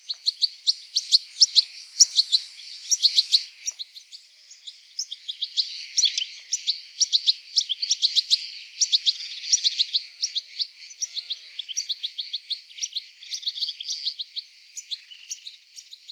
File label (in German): Stieglitz Ruf